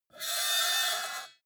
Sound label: metal lid screech short